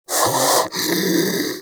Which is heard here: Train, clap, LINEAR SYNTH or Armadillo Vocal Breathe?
Armadillo Vocal Breathe